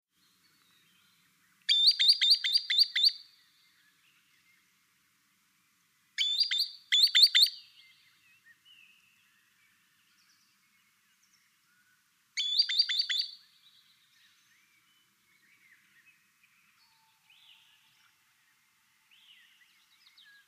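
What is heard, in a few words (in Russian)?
chernysh golos, green sandpiper voice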